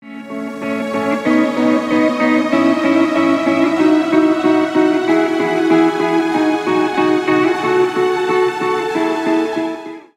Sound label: Church Melody; church melodies volume 1